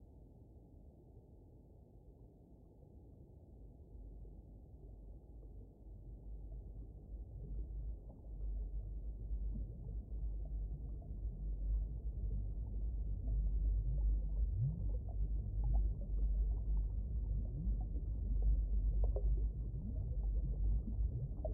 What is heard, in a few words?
Ambience; Winkydink